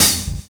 Chamber HiHat; Sonic Foundry (Sony Creative Software); Drums & Drones; Construction Kits

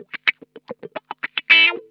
SCRAPE, Funk Guitar; Zero G - Funk Guitar

